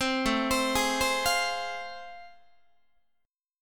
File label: A Minor Major 7th Sharp 5th, Guitar; arpeggiated; AmM7#5; 15,17,15,18,16,16; JGuitar